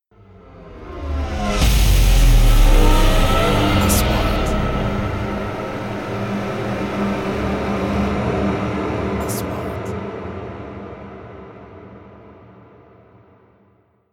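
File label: 3D SFX Trailer; مؤثرات تشويقية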